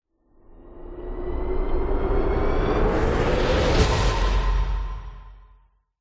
sound design cinematic riser into hit dramatic hard hitting tense; zapsplat